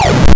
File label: tank fire; Keen1